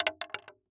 Weapon Bullet Shell 40 Cal Shells Multiple Shells Fall On Boardwalk; AdobeCC